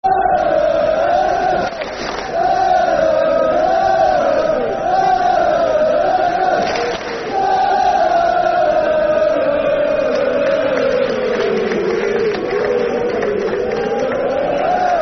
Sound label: Men Of Harlech Football Chants (Soccer Songs), Cardiff City; fanchants-com